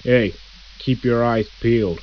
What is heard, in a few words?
zombie idle